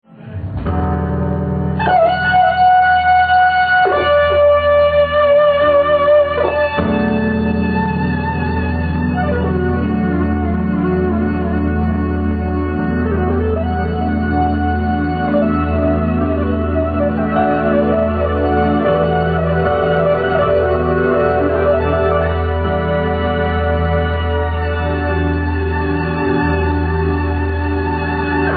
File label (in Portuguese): improviso com lead